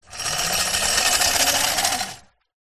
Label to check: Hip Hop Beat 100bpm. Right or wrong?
Wrong